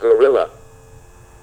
Gorilla

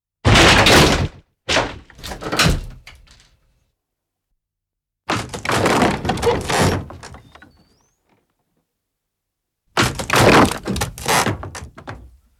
Crash Wooden Crashes Some Creaks Mix

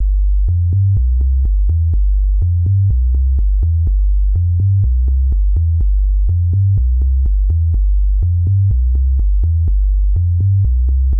124bpm bass

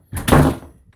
Door Slam

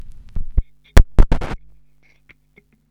Vinyl Cut

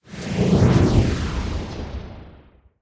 Abyss Transition

